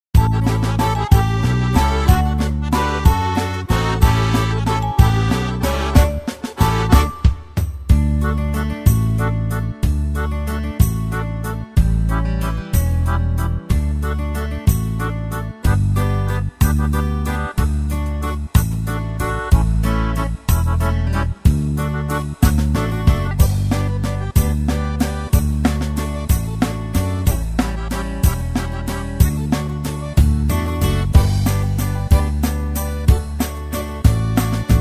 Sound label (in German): Schunkelwalzer, KORG Styles